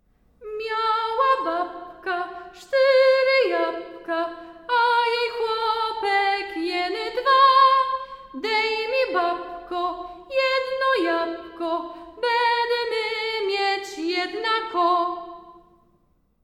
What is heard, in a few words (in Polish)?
Goleszowska mazurka